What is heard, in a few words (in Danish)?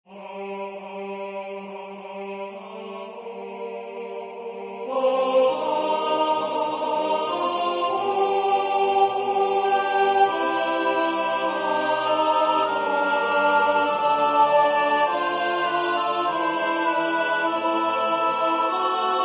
sopran 2; Skal blomsterne da visne